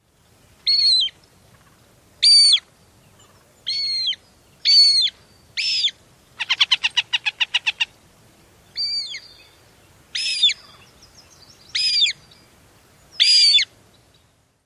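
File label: female squeals & chatter, Northern Harrier; NY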